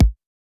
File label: kick, house